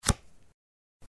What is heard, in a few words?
new card in table; blackjack